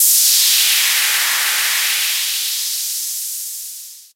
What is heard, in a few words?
Sweep, Roland JX-305